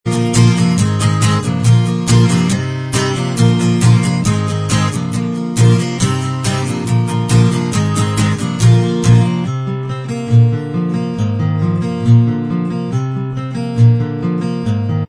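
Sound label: acoustic, instrumental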